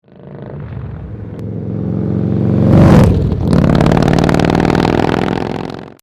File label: FLYBY; Vance & Hines 4" Turn Down Slip-On Mufflers for Harley Touring